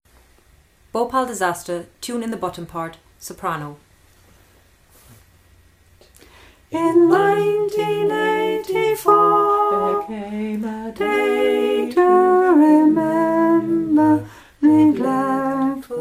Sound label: soprano